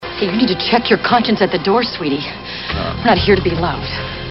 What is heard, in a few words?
Scream